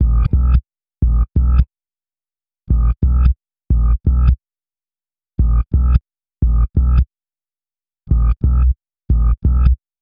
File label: Bass, Hip-Hop